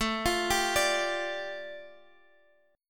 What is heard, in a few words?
A 7th Suspended 4th Sharp 5th